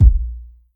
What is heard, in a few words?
small reverb kick sample a sharp key